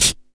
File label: smooth closed hi hat sample f sharp key; drum single hits one shots; hat samples